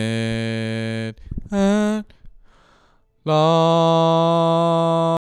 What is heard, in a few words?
Male Ambient Lead Voc